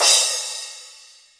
roomy drum crash sound d sharp key; Cymbal; drum sample

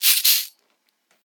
shaker percussion c key

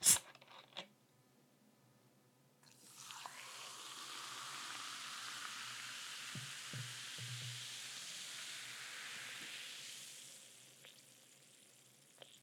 poured soda on lemon in glass

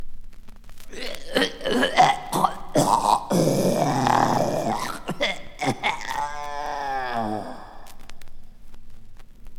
strangulation male

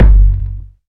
big room kick f sharp key

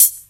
old school clear reggae hi hat e key

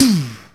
tom sample d sharp key; tom drum

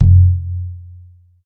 low floor tom drum single hit f sharp key

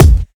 airy kickdrum sound a key; kick drum samples

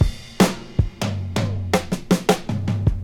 105 bpm high quality drum loop g sharp key; drum loops

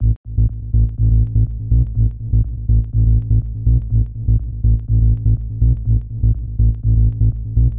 tech house bass - a - 123; loops; tech house bass samples

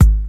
rap steel kick drum g sharp key; 1990s present; Duration 0:01; Samplerate 44100 Hz; Channels Mono

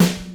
dark snare sample f key; snare drum samples

